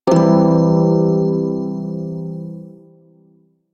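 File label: Prime Shawzin Major Chord A; Music; Warframe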